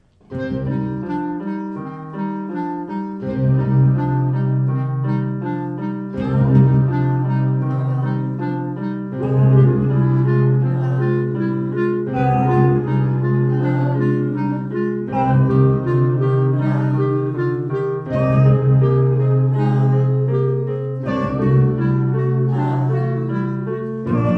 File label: Prelude